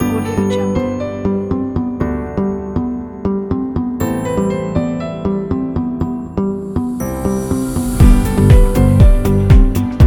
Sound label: inspiration piano background pack